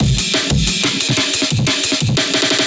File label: 180 bpm Angry Loop